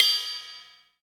ride cymbal single shot D key, Royality free ride cymbal sample tuned to the D note.